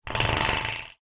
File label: Bottle friction; Mono